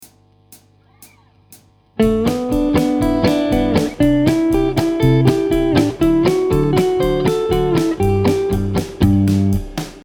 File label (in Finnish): pedal steel venytykset